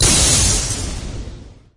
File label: hyperspace shatter